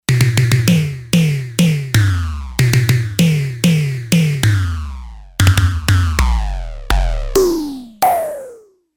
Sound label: Tom, Yamaha DX11; Factory Preset Voices